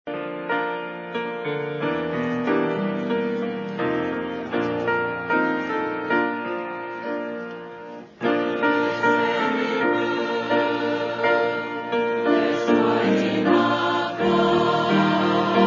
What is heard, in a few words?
choir